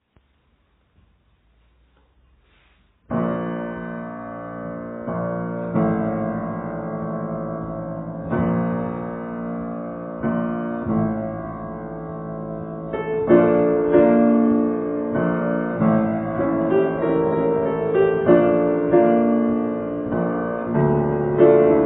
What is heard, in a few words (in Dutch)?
jaloers karaoke; musical de goede bakker